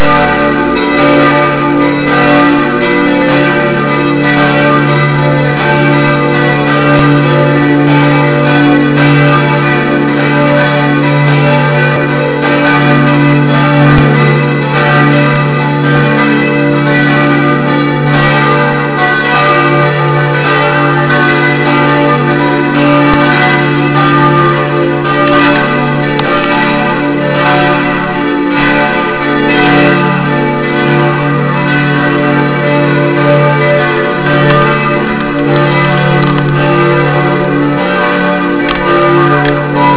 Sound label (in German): glocken; Duerrwangen